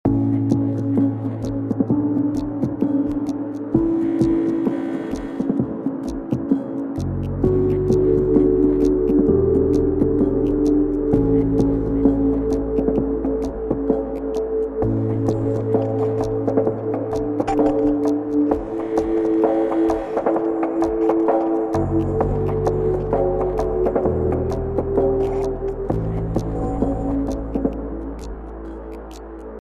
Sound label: bonus area; Submachine 4